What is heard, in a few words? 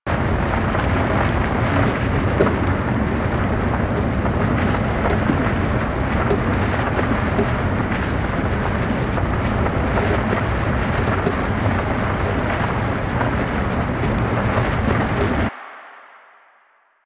Ground Loop, Motorcycle; Motorcycle Ninety Nine